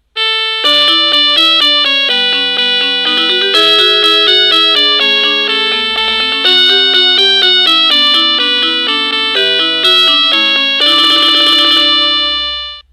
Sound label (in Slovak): Bezdrôtový zvonček melódia